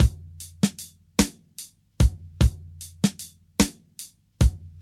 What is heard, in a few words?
75 bpm drum loop c key, Free drum loop - kick tuned to the C note. Loudest frequency: 1077Hz